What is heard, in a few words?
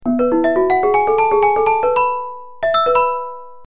Thai